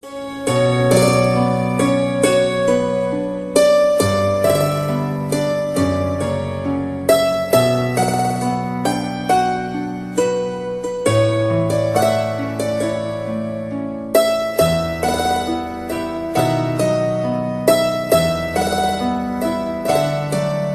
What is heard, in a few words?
mahabharat background; ringtone